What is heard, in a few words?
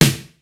KICK SNARE; Kid Nepro; Elite; KICKS SNARES